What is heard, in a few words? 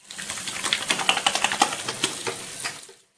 wash